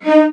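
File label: VIOLAS F3, Strings; ILIO; Synclavier Strings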